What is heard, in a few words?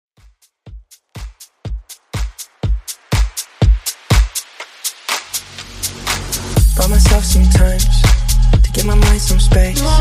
Top40 Future House Mashup